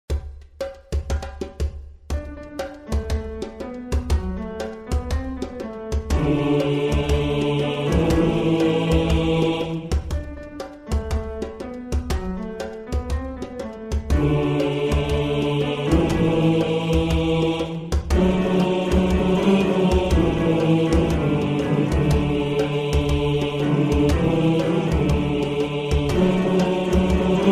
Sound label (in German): Bass; Sananina; Bass 1